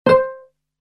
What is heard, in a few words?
Double Pizzicato